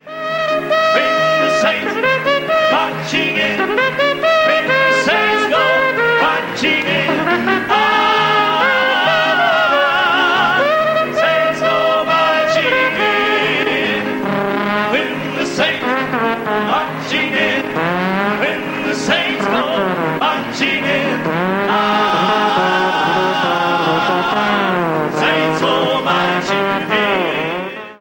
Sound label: glissando so clean you can eat off it